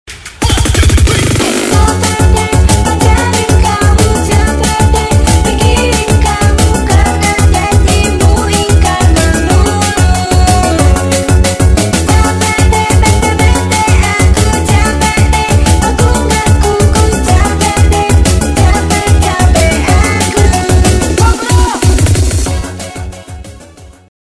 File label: house mix